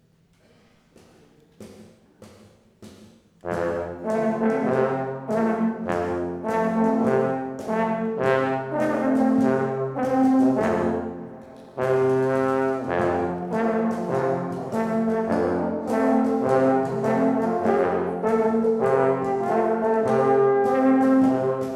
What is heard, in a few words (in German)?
Alphoerner Blues; Deggenhausertal